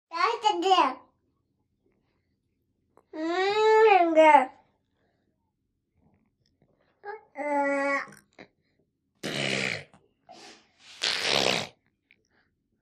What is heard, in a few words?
Baby Girl Vocalizati, thirteen and a half month old